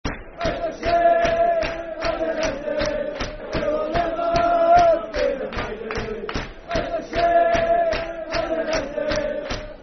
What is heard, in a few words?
Football Chant, Dundee United; We Shall Never Be Divided; Dundee United Dufc